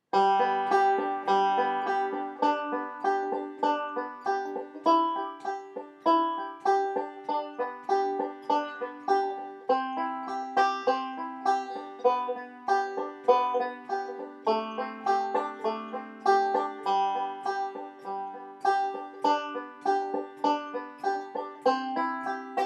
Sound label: twinkle roll with chords